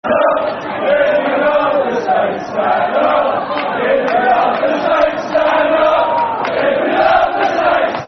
Stand Up If You Love The Saints Football Chant (Soccer Song); Southampton Sfc